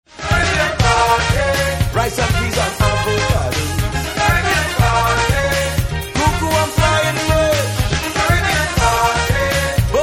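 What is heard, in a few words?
soca caribbean party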